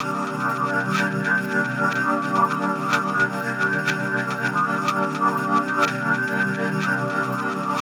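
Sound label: Pad Synth; Equipped Music; Loungin House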